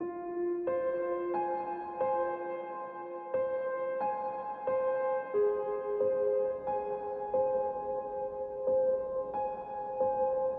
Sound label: Ambient Sadness; Music; NWNDS; Ambient music pack